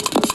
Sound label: Star Fanfare; SNES